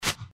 wingflap back; npc; cazadore

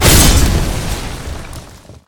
weapon impact stab

